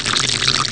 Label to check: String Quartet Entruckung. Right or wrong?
Wrong